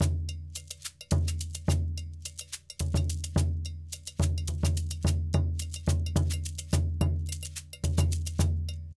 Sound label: Djoun, World Percussion; Bell, Shaker